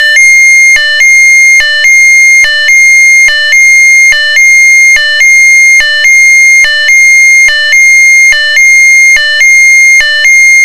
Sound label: special warning